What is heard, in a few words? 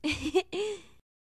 Kiss giggle; Sex Lines; Hilzartov; Fairy Size Doesn't Matter